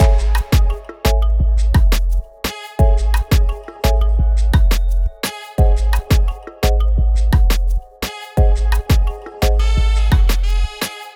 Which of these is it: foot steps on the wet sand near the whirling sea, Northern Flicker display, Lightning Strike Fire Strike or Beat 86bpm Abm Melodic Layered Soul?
Beat 86bpm Abm Melodic Layered Soul